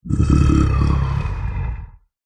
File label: horror male zombie monster or creature soft warning growl; zapsplat